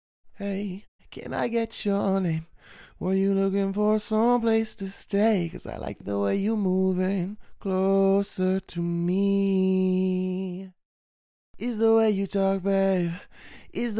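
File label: chill electro RnB, 83, E minor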